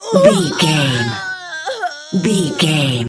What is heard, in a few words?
voice female death torture